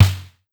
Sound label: Snare, Pitched Down; Clavia DDrum-AT